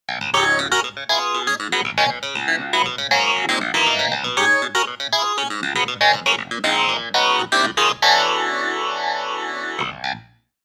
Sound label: phaser clav; juno-gi